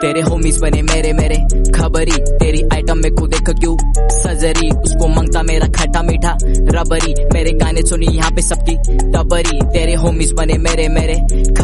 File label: Marathi song